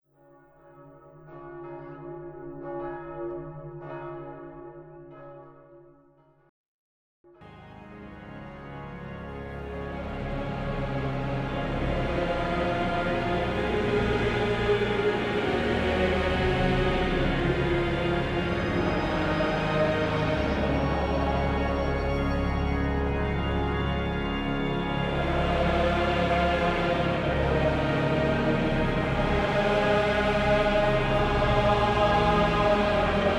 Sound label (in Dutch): klokgelui met aansluitend psalm; psalm 97 vers 1 en 6